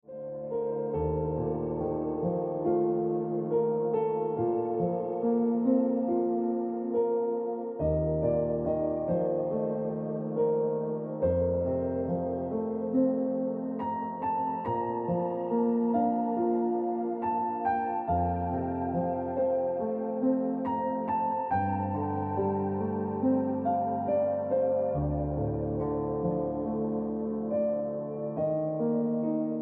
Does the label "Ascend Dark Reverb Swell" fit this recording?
No